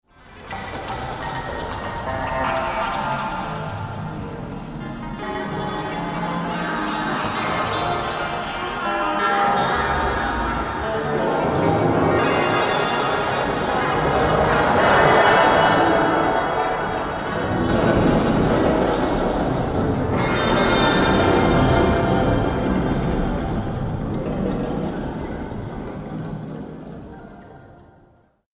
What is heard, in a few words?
Castle Of Dark Magic, Scary Soundscape